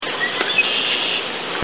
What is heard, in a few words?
blackbird red winged; birdsongs